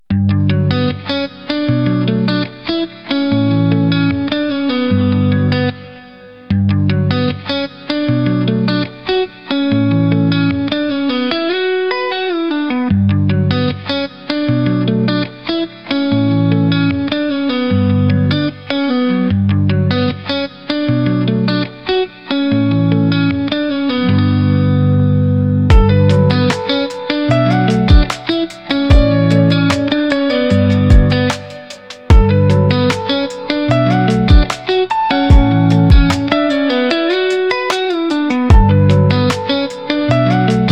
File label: Lofi Lounge; relaxing lo-fi